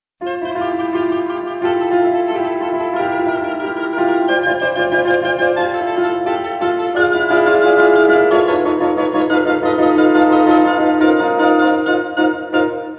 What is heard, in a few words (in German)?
für Oboe und Klavier